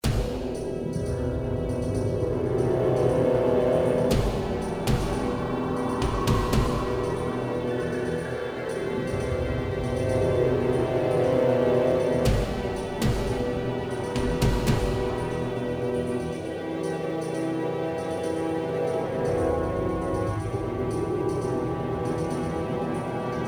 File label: slow, music; Soldier Of Fortune II Double Helix; XBOX